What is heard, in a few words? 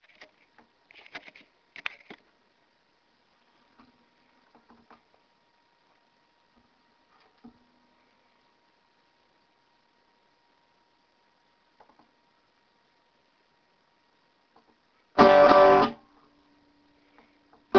strum